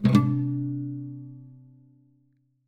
Classical Guitar Double Note Plink; Classical Guitar Extended Technique Stereo

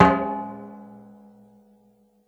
Oil Drum

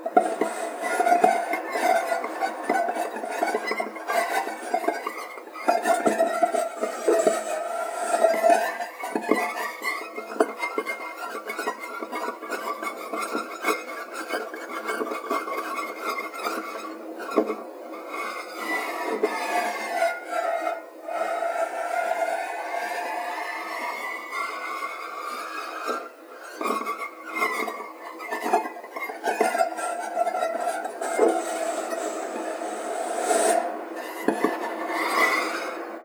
Knock Rub Erratic, Piano; Piano Miscellaneous

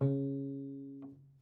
Prepared Piano

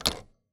Typewriter

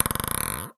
Ping Pong Ball Bounce; Balls

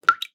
Drips

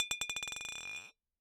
Bottle Struck

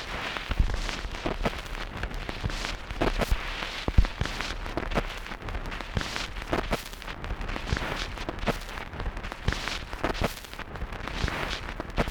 Vinyl Loop

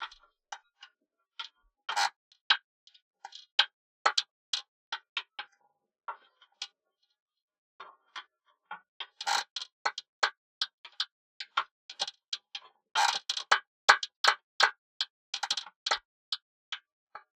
Sailing

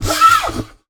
Classical Guitar Extended Technique

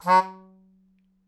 Melodica Short; Short Notes Chromatic

